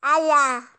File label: Hurt; MilkChoco